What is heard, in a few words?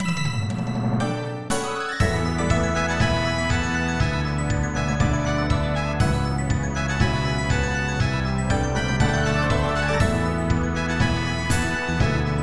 Battle Win; Slayers Wonderful; PS1 gamerip 1998